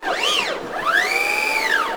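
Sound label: HYDRAULIC, ROBOTS; AKAI Anime Sound FX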